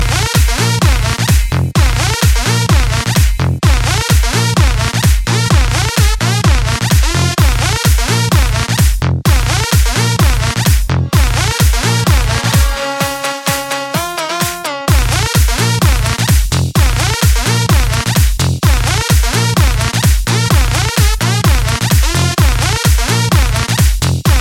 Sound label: Bass Rhythms